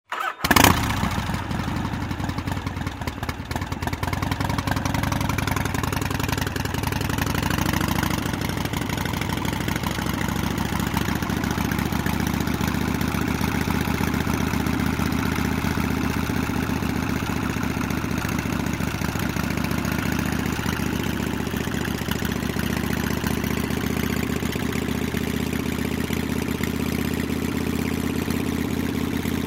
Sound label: Harley-Davidson motorcycle starts, waits, moves